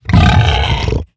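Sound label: hoglin angry, 疣猪兽：怒吼; Minecraft; 疣猪兽是一种生成在绯红森林的攻击型生物。它们是目前唯一可繁殖的攻击型生物。; 疣猪兽（我的世界）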